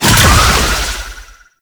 Balloon Mine Cast; Warframe; Follie Frame; Abilities

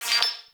Tech One Off, Ambience; Railjack

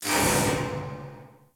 Galleon Electric Amb; Ambience; Warframe; Grineer Galleon

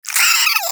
Cryptilex Vocal Pain; Characters; Warframe; Hunting Critters

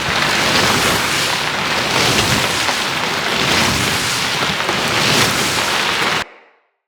Knee slide Loop Dirt; Tenno

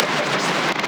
Static Out; Night Wave

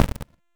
Kavat Summon Impact; Warframe; Warframes; Khora

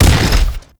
Shotgun Fire Shot; Kuva Sobek; Double Barrel Shotgun

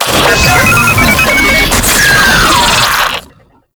Idle, Vocals; Enemies; Warframe; Proto Infested; Brachiolyst

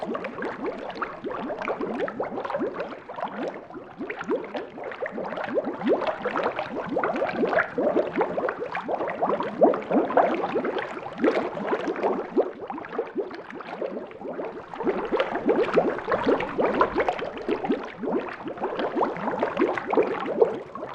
Personal Quarters Fish Tank Loop; Warframe; Hub; Liset Personal Quarters

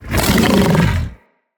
Vocal Attack Short, Hunting Critters; Characters; Predasite

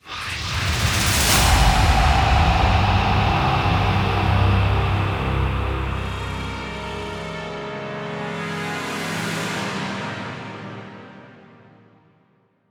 Heartbeat Cue, Music; Warframe; Deimos

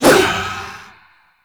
Void Dagger Heavy Whoosh; Warframe; Zariman Dagger; Void Mode

